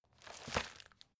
folder file jacket turn short

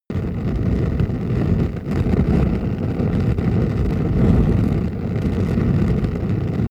fire object medium burn

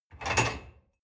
dishwasher cup grab soft

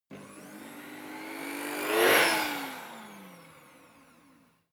fly by cleaning robot passing

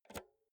insert jack multi socket quick

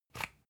usb port metallic out soft

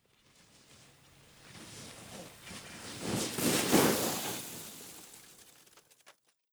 skiing

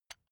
electric button drill direction switch lock soft

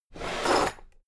drawer cabinet equipment open heavy halfway

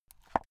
watch case small close soft

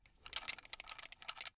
buckle plastic metal rattle short fast

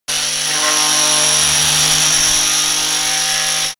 saw compact on load

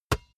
basketball single hand catch

